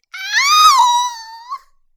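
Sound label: pain critical death; Suika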